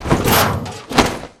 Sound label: rocket climb spaceship